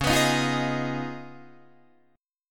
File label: Db 9th, Guitar, Open D